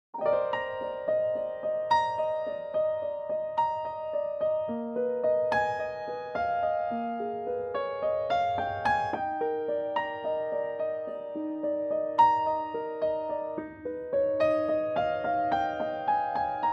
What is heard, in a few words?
Eb piano; key - Eb; Pianotrak